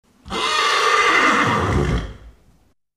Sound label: neighing horse